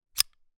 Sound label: gun bersa; still north media